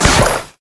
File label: basicattack; LoL; graves skin05